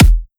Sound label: Kick